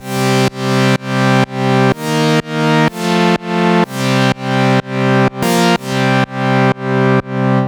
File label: Pad